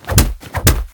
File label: frantic kicking; battle